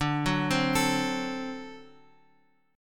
D 7th D Guitar Open F; x,x,2,1,3,4; arpeggiated; JGuitar

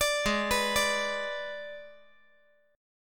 G sharp Diminished G sharp; G#dim arpeggiated; JGuitar

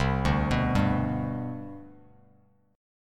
C Major 7th Suspended 2nd, C, down, Bass Standard; CM7sus2 arpeggiated; JGuitar

